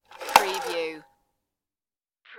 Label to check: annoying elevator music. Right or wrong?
Wrong